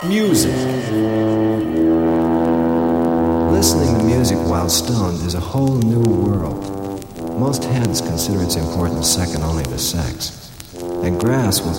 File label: music while stoned